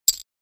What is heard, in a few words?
chip put down chip